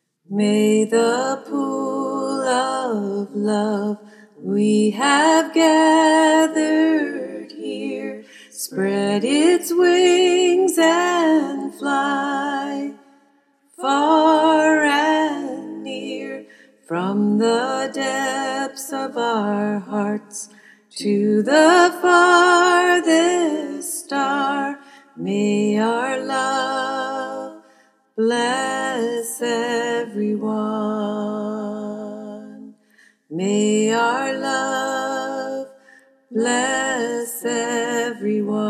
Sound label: alto, choir; freedom choir